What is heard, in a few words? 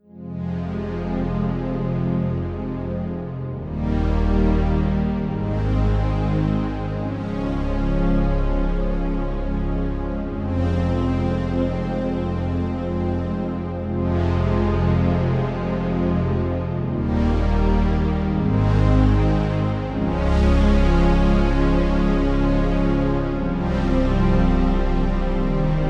Analog Pad; MOXF6